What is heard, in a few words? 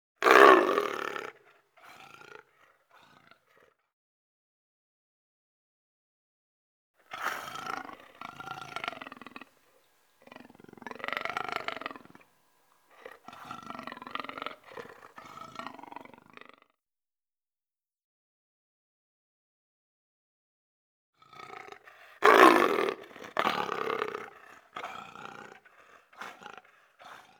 Animals Birds; Sound Ideas 6003